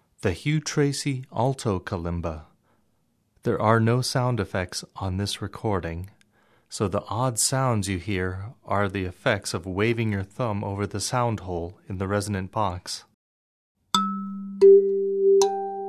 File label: Alto Example, kalimba